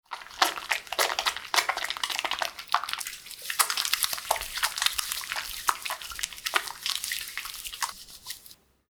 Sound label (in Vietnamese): Tieng rua tay bang xa phong